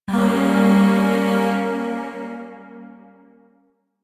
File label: Shawzin Phrygian Chord H; Music; Chords; Warframe; Zariman Void Shawzin; DE